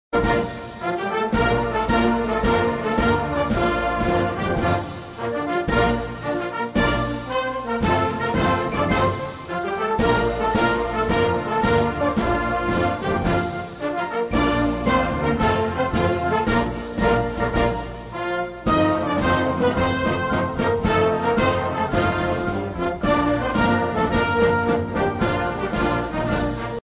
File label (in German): Parade Marsch